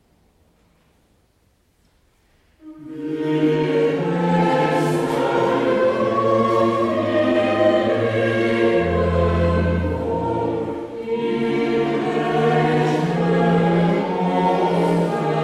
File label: choral; Bach; BWV4